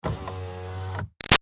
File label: Car Highlander Power Window Down Short; 2005 Toyota Highlander V6; Cars; Vehicles